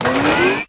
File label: Mall Escalator Activated